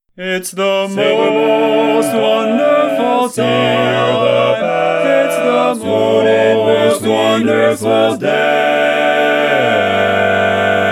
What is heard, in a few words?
All Parts, barbershop tags